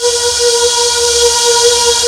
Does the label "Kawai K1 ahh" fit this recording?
Yes